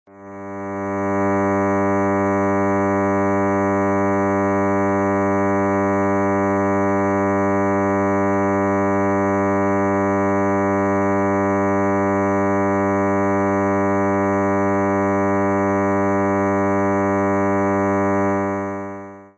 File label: double bass G 1st